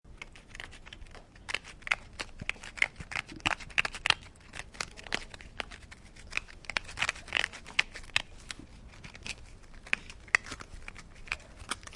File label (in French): animal qui gratte